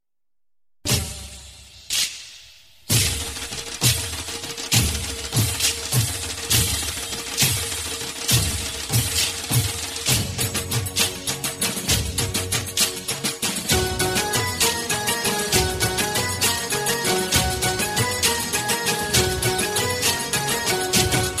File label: Dhol, Gujarati Garba